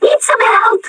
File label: pain heavy, metropolice; npc